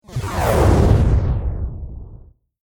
Whoosh Glitch Strech Break Sweep Distorted Future Scifi Robot; Sound Design; Whoosh Sound Effects